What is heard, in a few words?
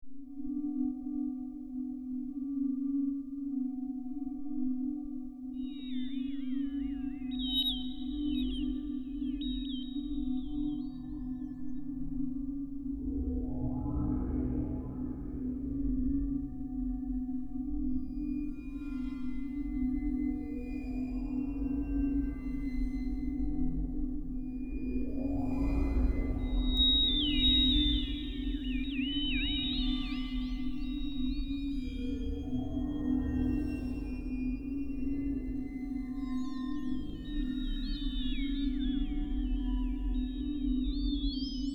Cavern